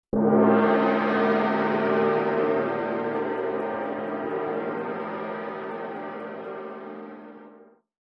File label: Gong